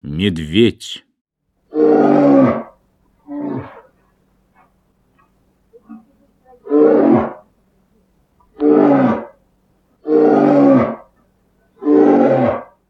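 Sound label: bear; Medved; Golosa ptic i zverei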